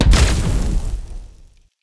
sand trap

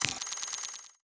ui scan

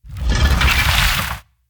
atk rock throw

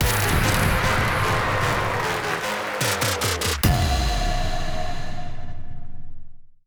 evt edm

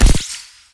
spark light

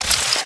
p atk shlddrill

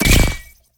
ninja sword

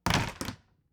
door open quite

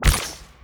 atk water mag

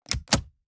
doorknob

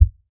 hit sw short

